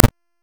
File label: Casting Device Blast; Weapons; Enemy